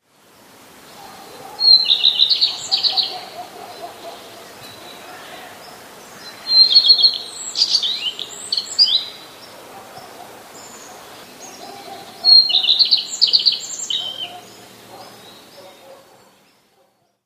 pleszka, trzech piosenek pleszki; wroblowe; zapozyczenia

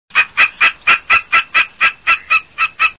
orlik grubodzioby, głosy

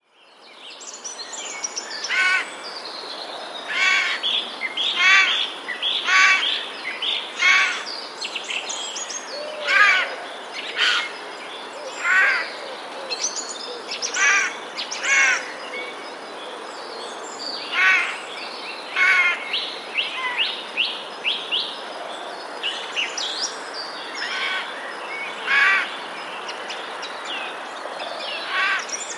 sojka; niewysokie okrzyki sójki

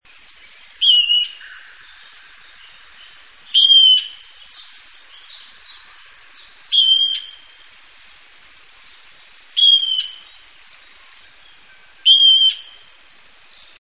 wodnik; wodnik - głosy samca

